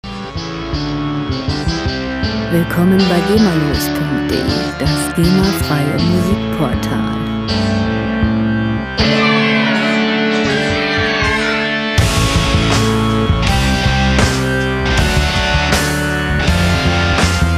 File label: rock